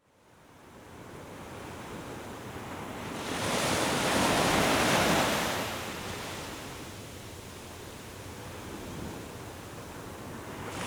Music Ocean, Gentle Ocean Sounds for Deep Relaxation; relaxation music of Peace Within with the soothing sounds of the ocean; soothing ocean waves; 34 minutes of soothing ocean waves